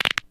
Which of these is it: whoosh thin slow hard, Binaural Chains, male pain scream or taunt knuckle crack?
taunt knuckle crack